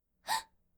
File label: Gasp Female Voice